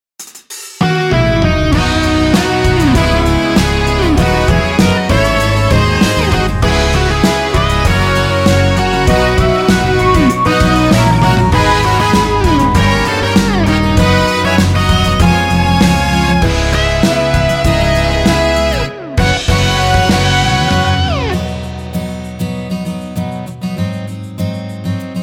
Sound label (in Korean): MR